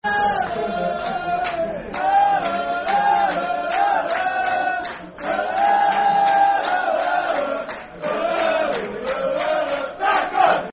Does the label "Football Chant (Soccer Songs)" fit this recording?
Yes